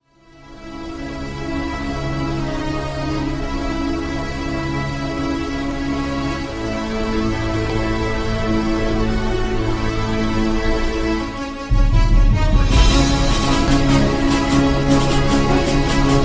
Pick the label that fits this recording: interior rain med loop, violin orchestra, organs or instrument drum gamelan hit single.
violin orchestra